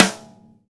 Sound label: Rim, Snares